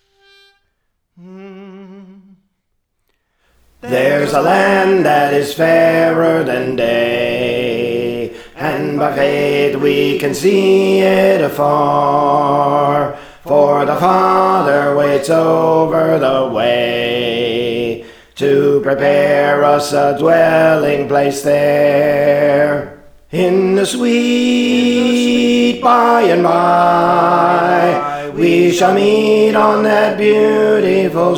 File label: Alto, Praise For The Lord Training